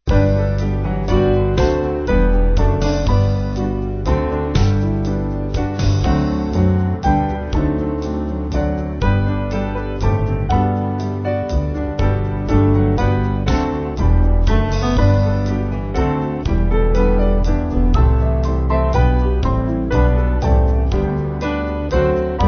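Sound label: Band, Music Backing Files for Churches; Marvelous Grace; Towner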